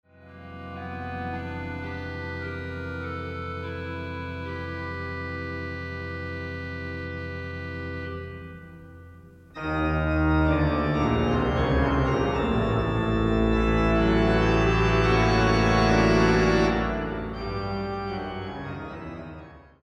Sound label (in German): Orgel Drop Outs nachher